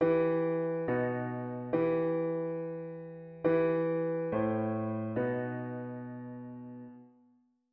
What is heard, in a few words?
diat root w bass